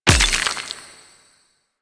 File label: Mining Laser Gem Fill; Weapons; Warframe; Eidolon Mining Lasers